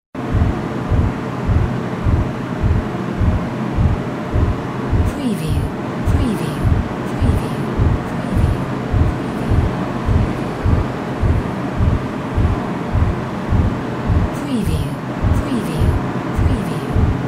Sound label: AMB VENTILATION SHAFT